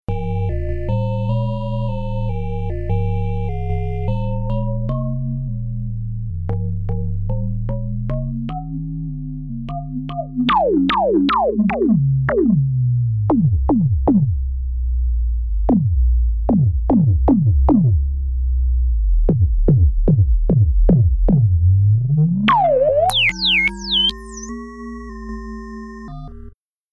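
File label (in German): iP Bass Donk Filter Resonanz, synthesizer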